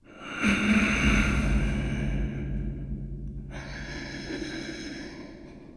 zombie last

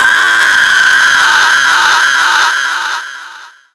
Siren Scream; zombie plague

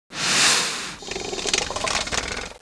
alien idle

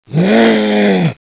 pain; zombie plague

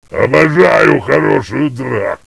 zombie burn

